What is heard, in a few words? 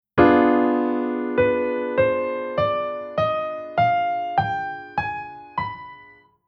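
Chord Scale Minor Harmonic Minor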